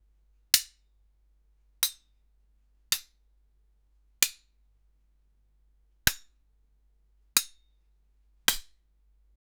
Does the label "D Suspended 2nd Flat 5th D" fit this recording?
No